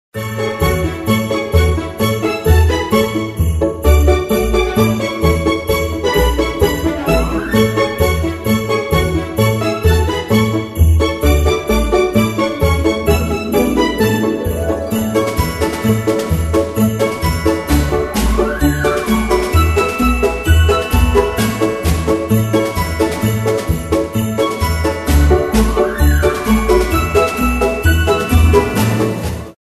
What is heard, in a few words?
bgm; xmas 2021